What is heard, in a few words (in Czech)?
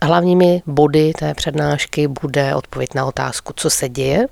mlaskani; zvuky na procvicovani strihu